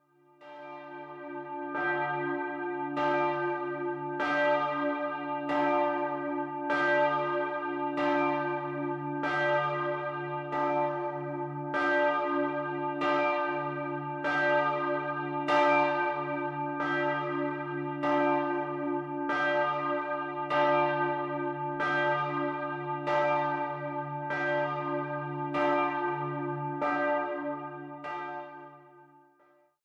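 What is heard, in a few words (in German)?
gemeindeältestenglocke, geläut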